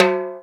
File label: sharp tom single hit f sharp key, tom drum single hit tuned to the F# note; tom drum samples